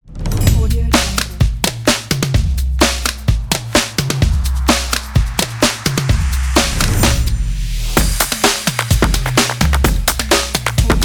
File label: drums